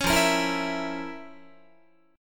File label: F Major 7th Suspended 4th Sharp 5th F, Guitar; strummed